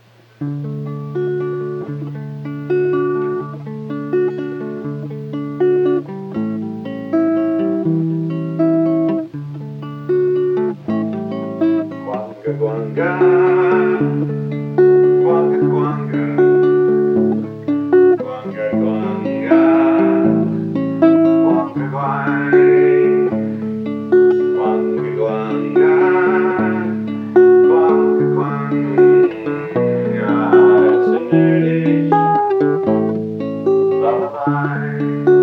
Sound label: nurdish lullaby